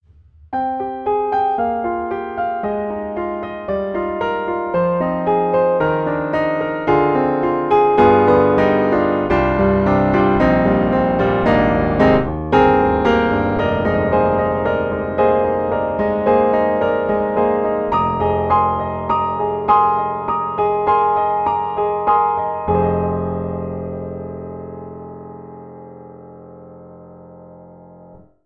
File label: Pianoteq D4 Under Lid, piano; MODARTT S.A.S.